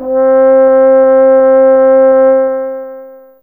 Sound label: Old Horn C3, Fairlight CMI IIe; Brass1